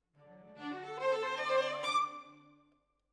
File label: Violins, jazz for piano